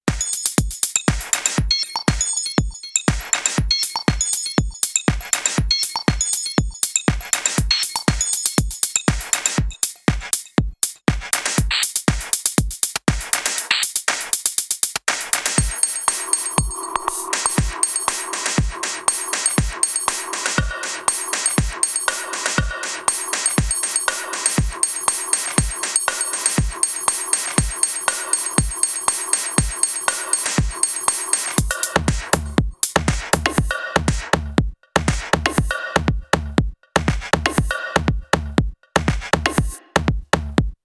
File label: electro